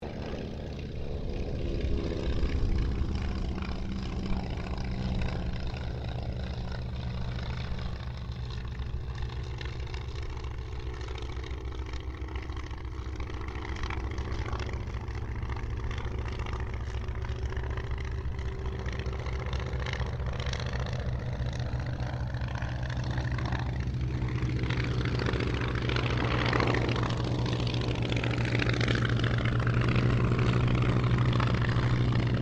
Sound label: Ryan Ryan Auster pass; Mixed aircraft squadrons; Airplanes; Vehicles